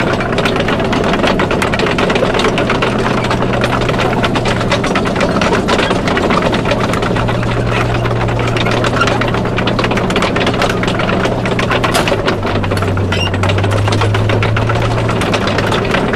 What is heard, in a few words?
tracks speed, engines tanks; War Thunder